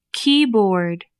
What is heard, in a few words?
keyboard